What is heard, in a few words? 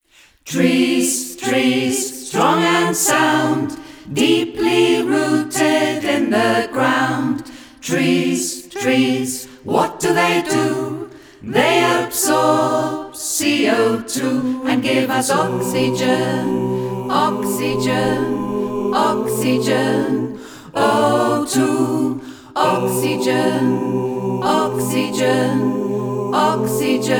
SATB taster, choir